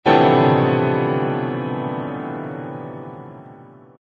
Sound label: piano rainstorm chord